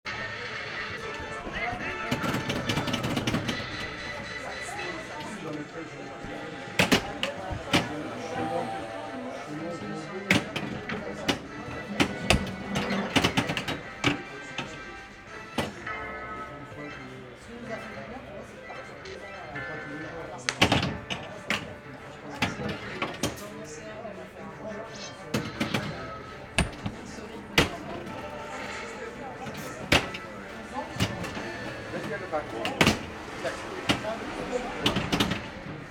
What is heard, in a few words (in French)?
Flipper café